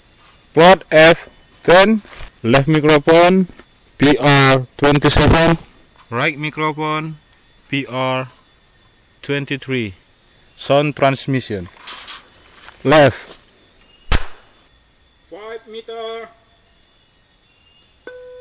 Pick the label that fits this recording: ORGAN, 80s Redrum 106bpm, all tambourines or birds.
birds